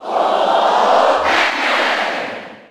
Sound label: Cheer German; Pac-Man; SSB4